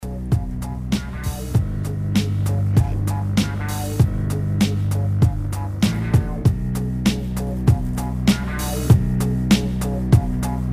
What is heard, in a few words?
rap battle